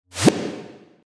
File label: POP